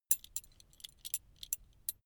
metal belt buckle clatter long soft

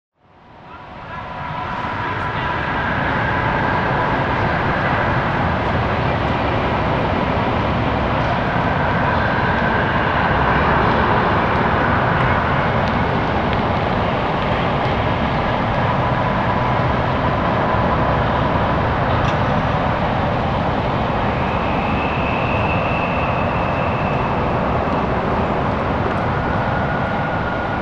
noisy wind drone

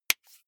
marker close lid

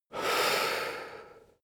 male breath medium out

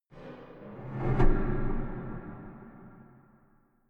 impacts

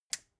metal button push power on

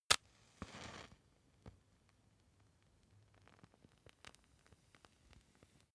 match light

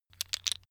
tiles wood

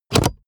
plastic latch wobble

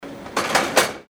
bottle packing

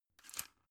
drug blister put in

